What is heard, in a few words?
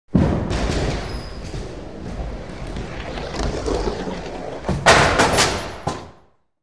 Bowling Ball Rolls